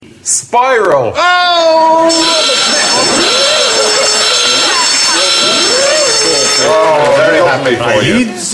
alarm

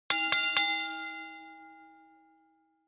wwe ring bell no crowd

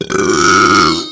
belch; shrek

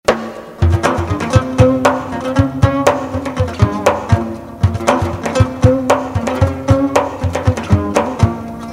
lute

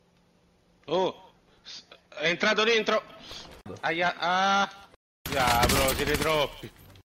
aia aaaaa remix

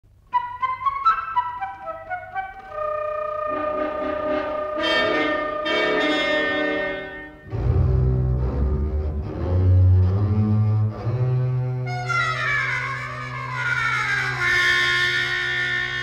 ohio backround music

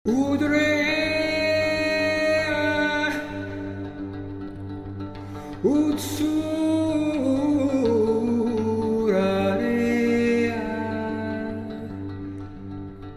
song bronze age sumerian

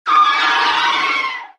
female eagle sound

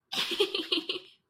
female giggles

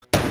slams desk

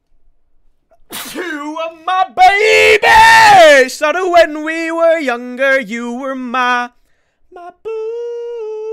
sneeze

